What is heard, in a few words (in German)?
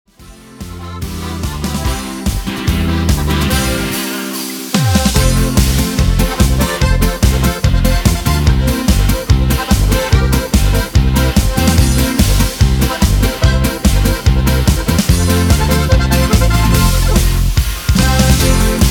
Hörprobe G C F B